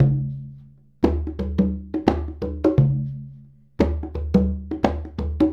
Congas; Essential Percussion

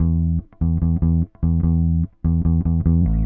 Bass, Indie Rock; Sonic Foundry (Sony Creative Software); Indie Rock Anthems; Session 11 - Silos

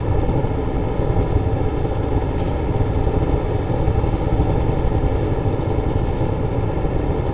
Drone Ambient; Drums & Drones

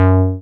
SYN TBM G3, MC202; Sound & Vision - Gigapack I CD 2

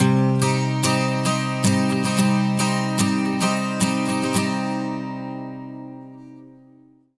MIN 12 S, Acoustic Guitar Loops; USB Soundscan vol.04 - Electric & Acoustic Guitar Loops; AKAI

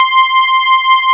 ANALOGY C6; Q Up Arts; Dream Experience

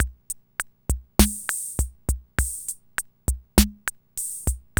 CR-78; Sound & Vision - Gigapack II

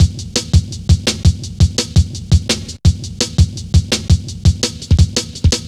Steppy, Jungle, Drum Loops 168.5; Zero-G Jungle Warfare 1, 2 & 3

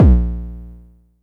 Low Kicks A#1; LIMAT Div Dept; Drums

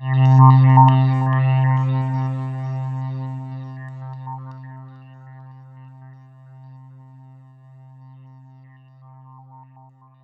C2, SynthLine; 90 s Sample CDs; NiceBeats; SynthLine VOL-10

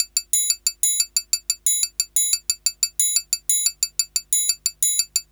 Triangle Loop; Sonic Foundry (Sony Creative Software) - Fluid Dynamics Drum & Bass; Construction Kit; Percussion

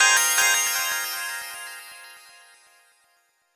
Fm11; Chords; M8; Electronisounds

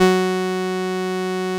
505, String Machines

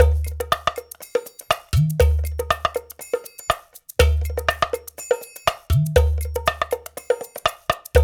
Tonga HipHop

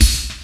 WAGON PC, Industrial; USB Soundscan vol.24; AKAI

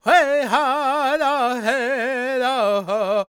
C# MIN, World Voices, LAKOTA; Spectrasonics Vocal Planet CD5

